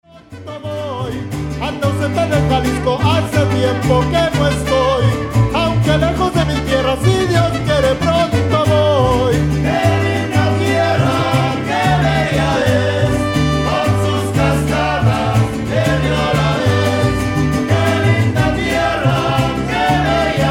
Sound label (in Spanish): mariachi